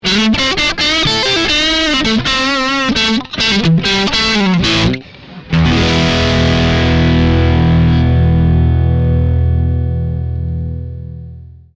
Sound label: dirty neck, mono